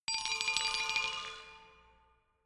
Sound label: glockenspiel mysterious tremolo low short; percussion